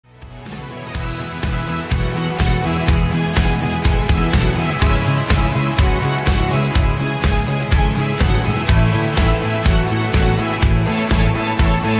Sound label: Instrumental